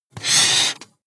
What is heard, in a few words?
marker mark hard